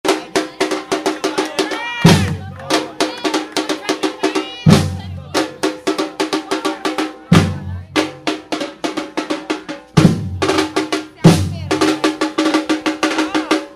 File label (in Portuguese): so bateria